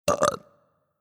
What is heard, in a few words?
Burp; shrek